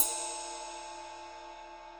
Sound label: 22 inch rock ride ping, cymbal; z3; Zildjian